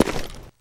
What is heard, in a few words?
footsteps, tile; player